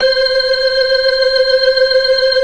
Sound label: FAST VIB, ORGAN, TREMOLO VIB